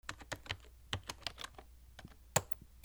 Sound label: OFFICE